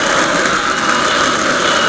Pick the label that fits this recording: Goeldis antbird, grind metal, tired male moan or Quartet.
grind metal